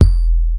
low tone bass drum e key, kickdrum sample tuned to the E note; kick drum samples